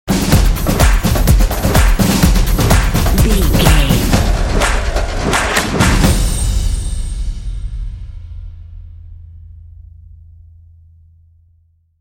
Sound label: mighty impact stinger logo